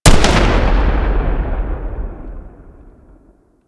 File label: Torpedo Explosion, weapons; Grn Torpedo Pistol